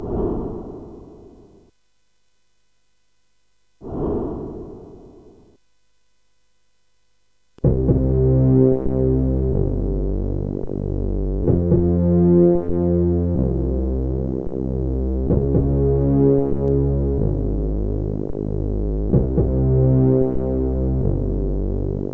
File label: CSG8580R5